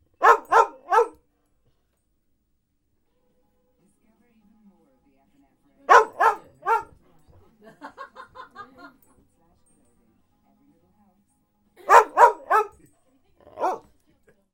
barking at the adverts on the tv

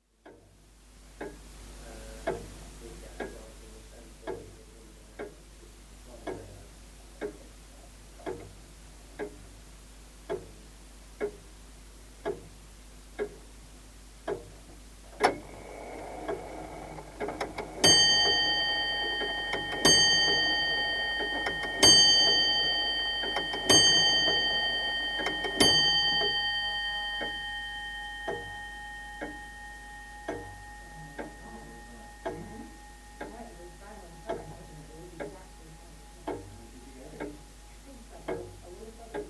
chiming grandfather clock at barnet museum

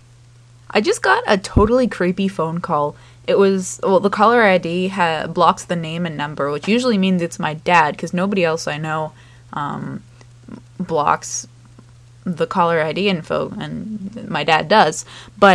creepy mouth breather phone call

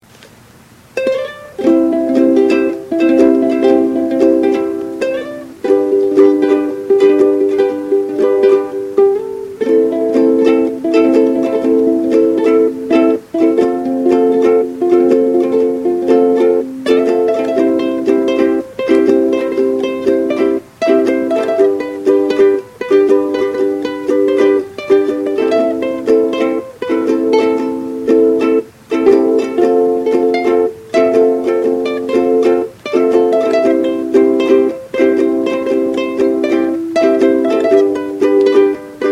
ukulele instrumental

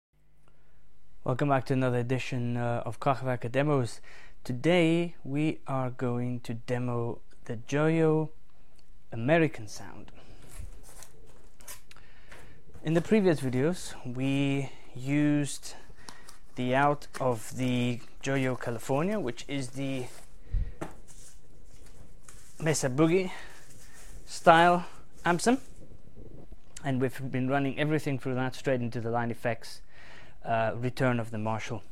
american sound effects pedal fender amp sim; joyo

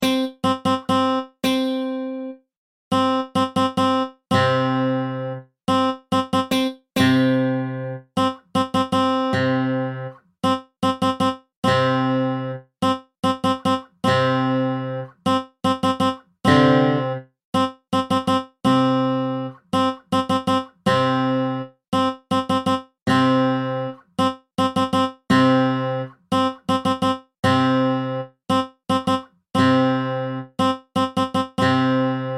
blues track with drums

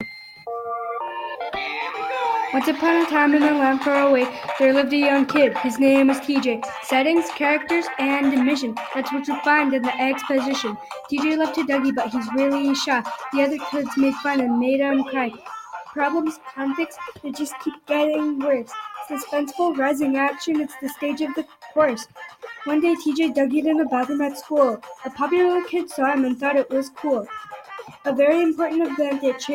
plot rap

